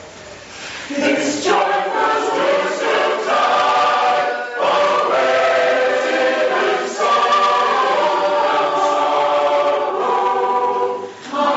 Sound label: Choir; This Joyful Eastertide; Chancel Choir